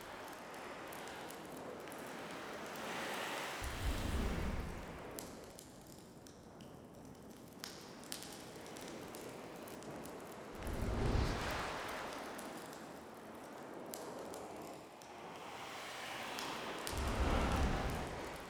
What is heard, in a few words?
ambience heart room ambience loop